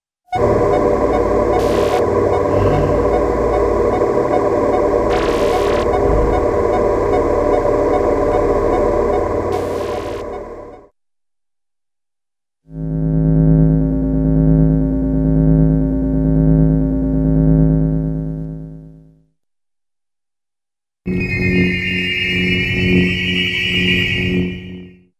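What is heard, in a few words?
Motor Hum with constant Blips & Occasional Zaps; Cartoon; Hollywood Edge; Cartoon Trax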